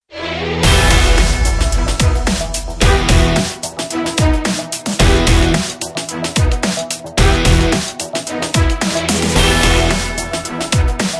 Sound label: Orchestron Full Mix